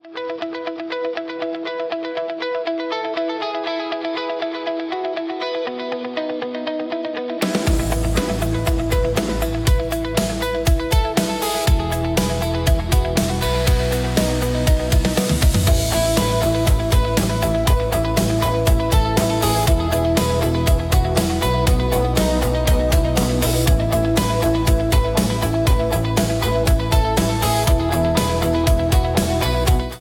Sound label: simple and easy; business music pack